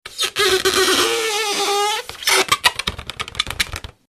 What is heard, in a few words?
Balloon Scratch